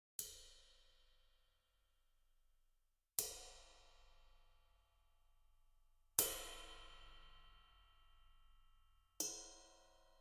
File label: Ride Cymbal 22 - Birch Rutes